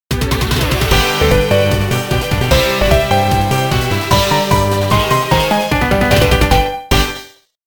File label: RADIO JINGLE; Pokemon Heart Gold and Soul Silver